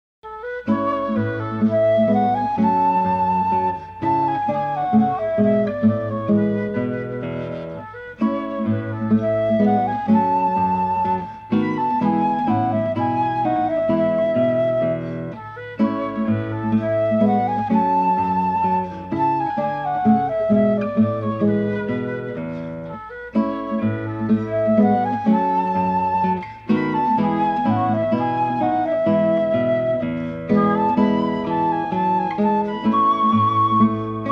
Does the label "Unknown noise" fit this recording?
No